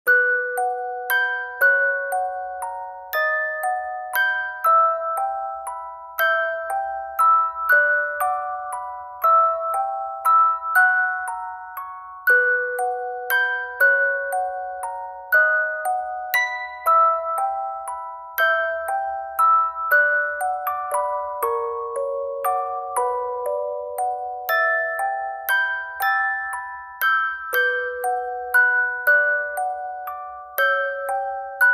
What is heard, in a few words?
Music Box; Anastasia